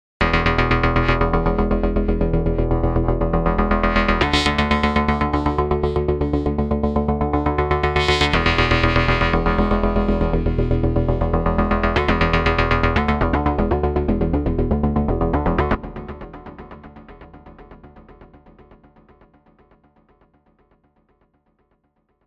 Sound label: Kaox Arp